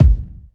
kickdrum sound g sharp key, kick drum single shot tuned to the G# note